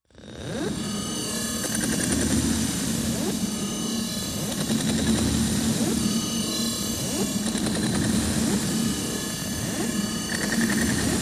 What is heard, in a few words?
Air Raid Press Machine, alarms